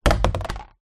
plastic washing basket tub down on concrete ground; foley